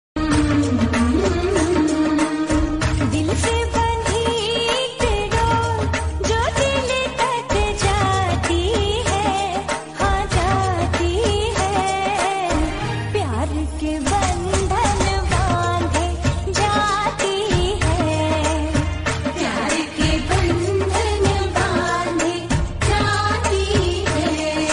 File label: bhakti; vat savitri